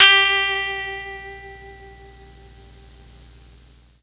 G3 guitar, mellotron MKII

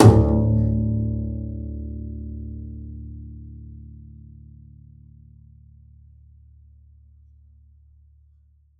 pizz noise super d, double bass; dsmolken

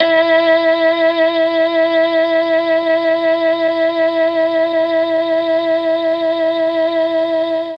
E3 MKII sax, mellotron

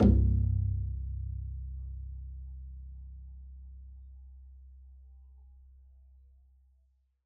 pizz c1, double bass; dsmolken

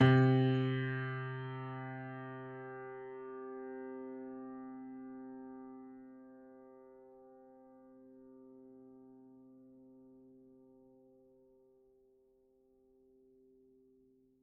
GPiano sus C2, Grand Piano, Kawai; Chordophones; Sustains

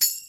tamb, Tambourine

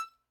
Hohner Special20 Soft rel E5, Harmonica, Releases; Aerophones; Free Aerophones

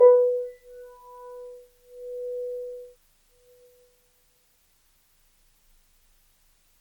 Grand Piano, Kawai, Sustains, B3; Chordophones; Legacy

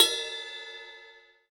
Bell Hard; hydrogen drum samples

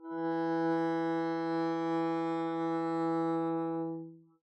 arco e3 p up, double bass; dsmolken

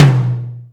drum tom mid hard

